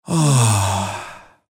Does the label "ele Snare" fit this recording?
No